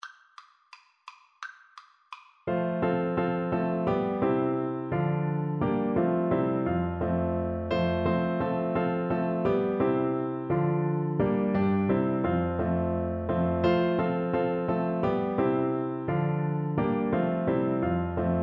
horn, French Horn

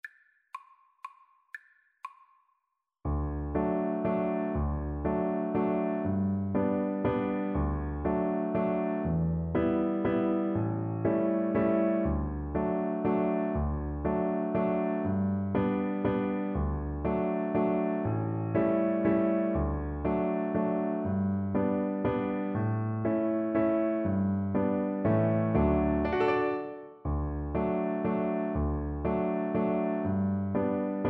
si bheag viola

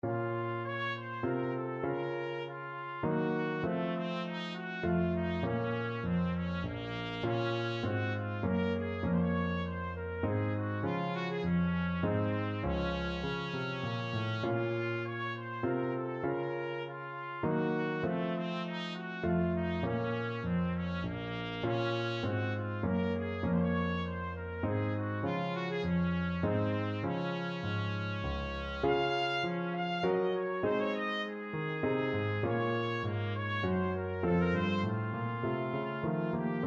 minuet in d minor, trumpet; bach; bwv anh 132

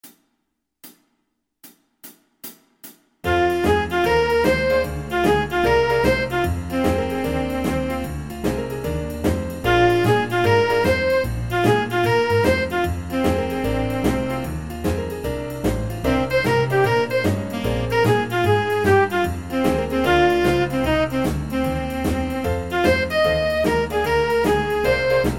shuffle, jazz ensemble

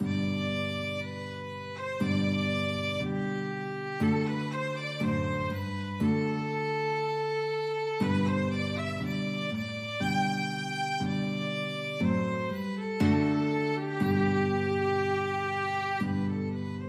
thine be violin guitar duet

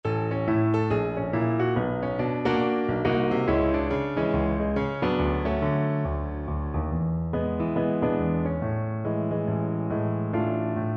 jump blues, cello

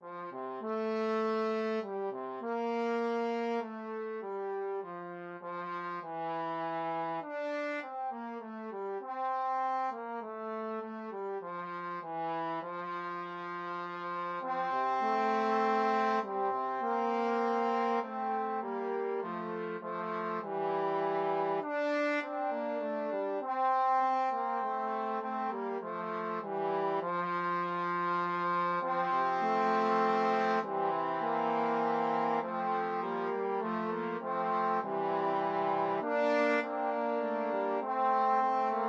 dona nobis pacem trombone trio

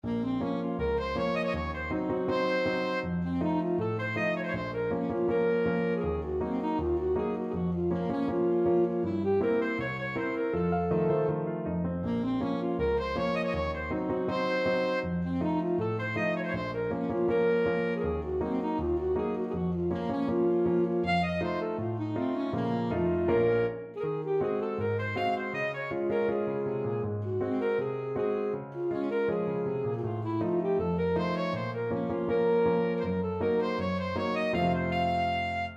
tamoio, saxophone

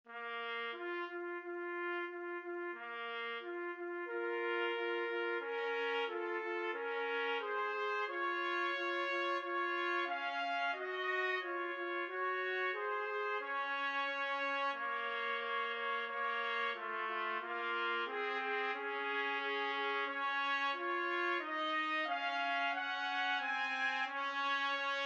pavane 2 trumpet; trumpet duet

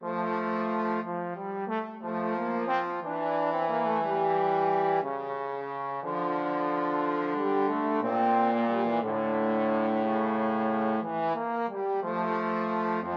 duos adagio, trombone duet

